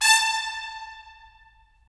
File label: BRS, Tps Stacc; Roland - LA Composer vol. 4